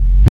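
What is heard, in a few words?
reverse KICK; East West - Phat & Phunky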